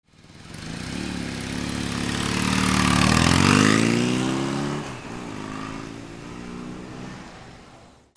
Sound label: VEHICLES